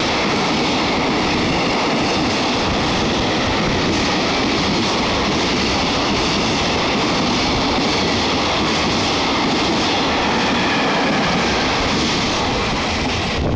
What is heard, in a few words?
freight; kurashiki